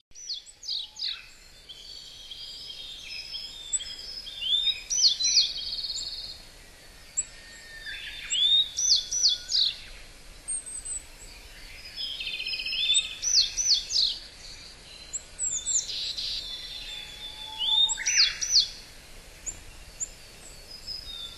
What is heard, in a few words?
chorus kuroji